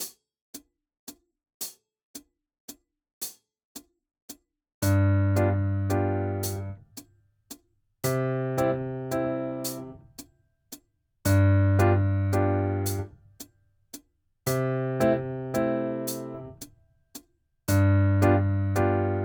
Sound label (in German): 4 Toene Begl.